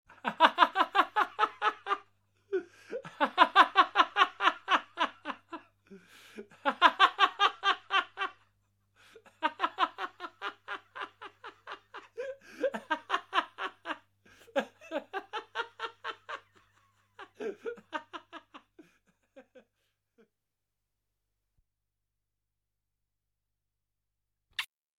RISATA

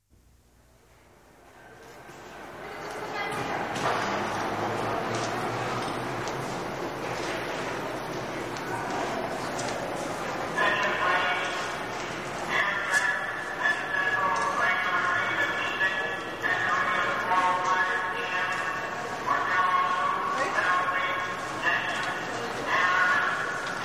FOLLA STAZIONE TRENI

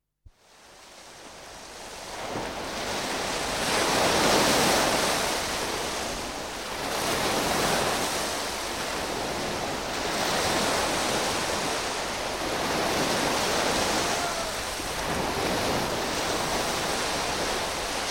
RISACCA MARE SPIAGGIA

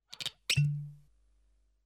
BOTTIGLIA STAPPATA

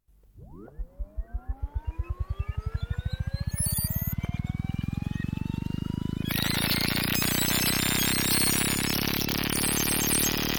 RIAVVOLGIMENTO REGISTRATORE A BOBINE

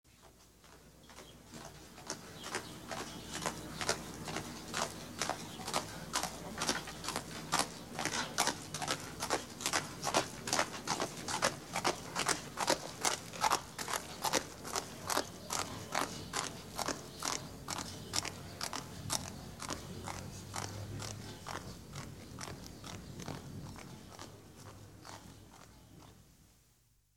CAVALLO

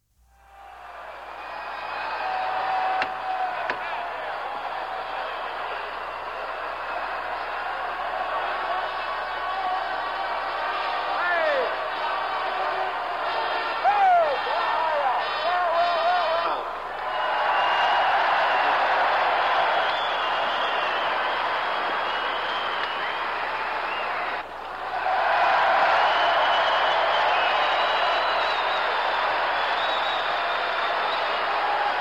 FOLLA STADIO CON BANDA

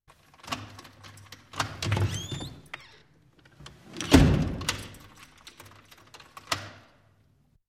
PORTA CIGOLIO E CHIUSURA

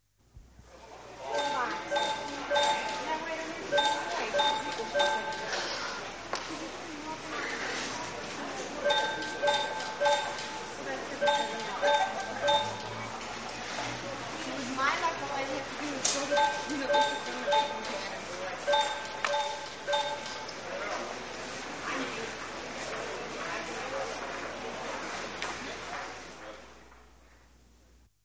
FOLLA ALLA METROPOLITANA

